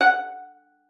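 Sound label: violin pizzicato F#5; Xsample VOL-5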